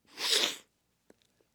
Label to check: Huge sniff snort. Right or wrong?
Right